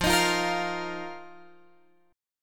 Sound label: F 7th Suspended 4th F down Guitar Open F